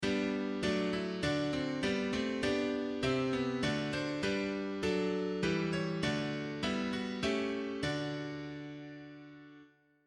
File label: Cadence